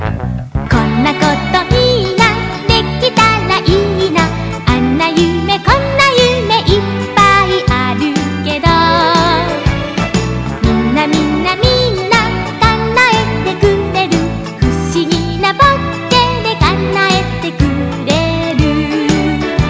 Tiếng hát Doraemon; nhac thieu nhi

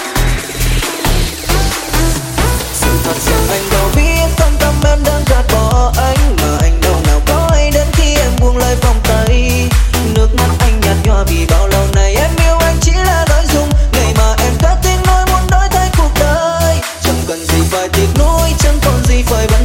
Nhac Chuong Remix

